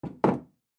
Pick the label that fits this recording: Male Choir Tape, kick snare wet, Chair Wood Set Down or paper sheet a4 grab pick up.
Chair Wood Set Down